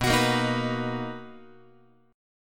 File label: B Major 7th B down Guitar; strummed; JGuitar